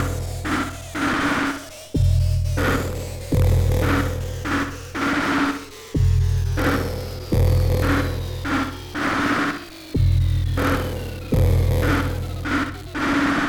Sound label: SpazeDrum; Talking To The Tree